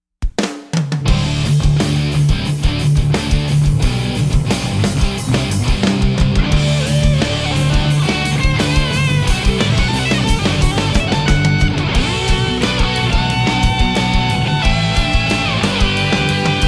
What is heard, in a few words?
Vox Valvetronix XL Modern Hair Farmer